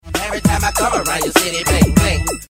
bling bling

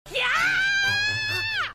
screaming

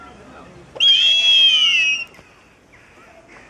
snowy owl, zoo; dublin zoo

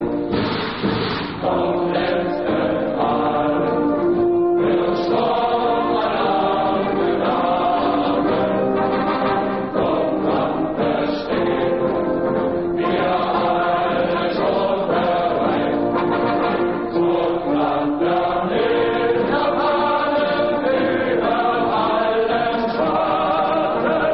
military march

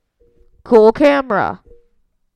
cool camera

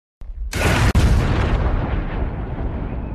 snap

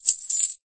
coin

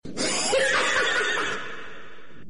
laugh; joker

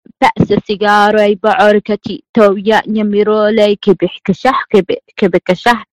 somali rap

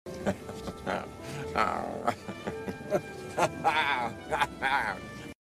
noises; goldblum

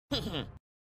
laugh; luffy

